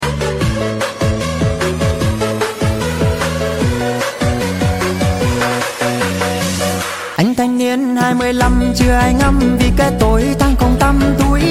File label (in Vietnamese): Nhac che thai lan remix; tiktok